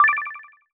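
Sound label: System Check; Disney Star Smash; Android, iOS, Mobile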